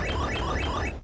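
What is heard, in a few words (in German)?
wolf zieht schwein; gamesoundopedia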